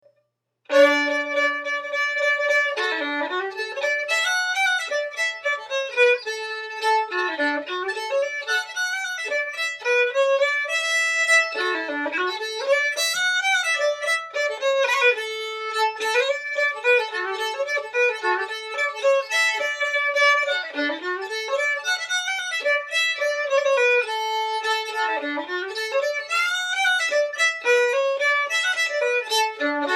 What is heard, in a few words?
fiddle; Banjo Tramp